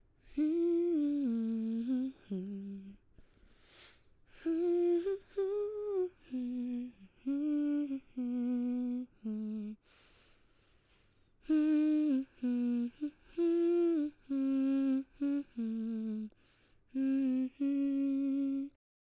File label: Girl Humming